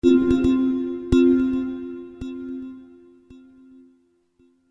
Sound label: Synth Chime